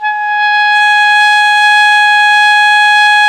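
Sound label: ALTO pianissimo A 4, Sax; Giga Samples Collection; ALTO SAX PP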